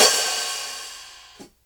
dark open hi hat f sharp key

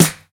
sizzle steel snare drum sample e key

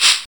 clean shaker sample g sharp key; percussion

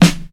smooth acoustic snare sample e key

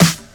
old school clean lo-fi steel snare drum e key

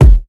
fat bass drum one shot f sharp key; kick drum samples; one shots

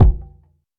2000s phat steel kick drum a key; kick drum samples

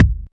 mid range bass drum sound c key

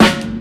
smooth snare a key

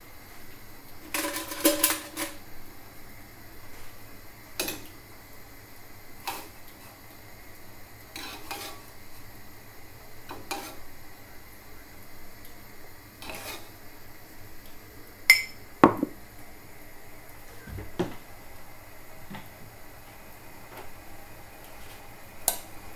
making an amigo coffee; soundscapes

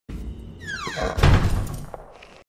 door slam, A door slammed hard, after a fight.; wood

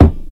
old school rich lows bass drum sound D sharp key

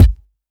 short reverb kick drum one shot F sharp key

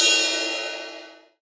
trap crash sound f key

